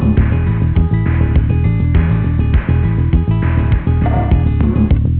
quiet intro; Delta Original

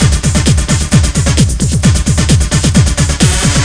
beat; Amiga-CD 1996 #5-6; sampler

